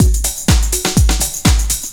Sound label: loop; SoundFactory; SMPMANIA